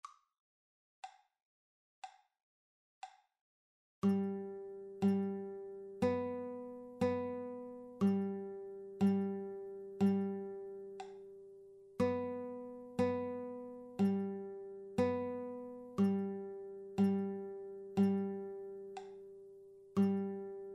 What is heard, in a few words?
open strings, guitar